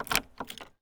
car

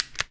phone

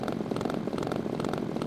chainsaw idle

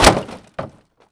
wood

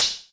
scratch

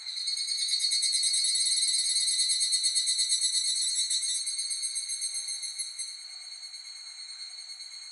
semi higurasi

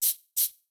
shake

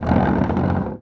chair

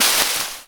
bush

